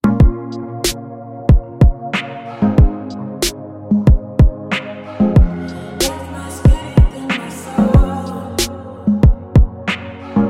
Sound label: instrumental